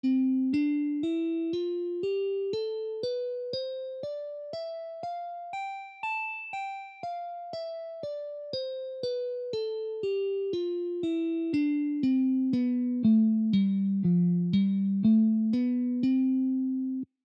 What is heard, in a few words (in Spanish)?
escala de do mayor posición 3